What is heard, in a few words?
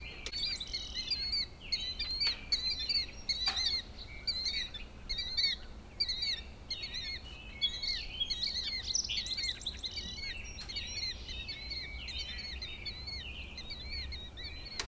killdeer